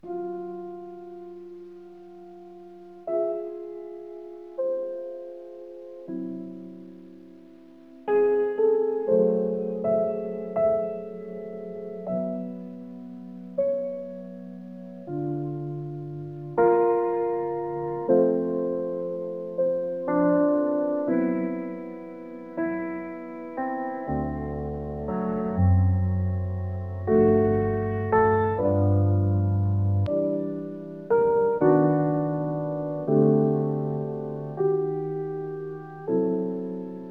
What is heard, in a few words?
nearly empty waiting room, sleep jazz harbour rain